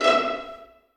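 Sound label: 1st violins pizzicato E5; Sonatina Symphonic Orchestra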